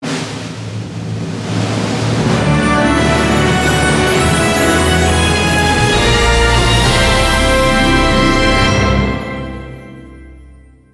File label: Chest Opening Stinger; epic mickey wii gamerip 2010